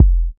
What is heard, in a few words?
clean urban bass drum sound f key; kick drum samples